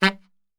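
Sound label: G saxophone shot, Brass Super Section; Best Service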